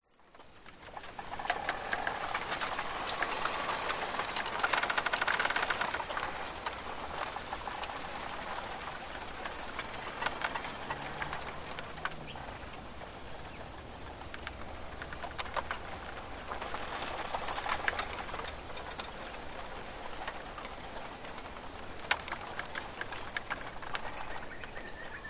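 nature forest bamboo tree blow creak in wind birds in background australia; zapsplat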